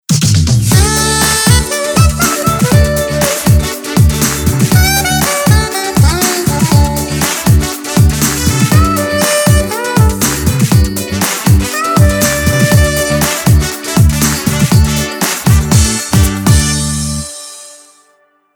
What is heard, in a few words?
Sax Jingle